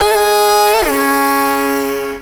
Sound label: Vocal Sample Pack Phrases C#m; Slate Digital Omnivox Vocal Sample Pack